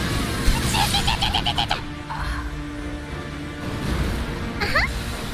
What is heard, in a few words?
weird death noises